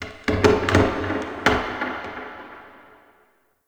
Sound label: Wood Creak; Warframe; Shadowgrapher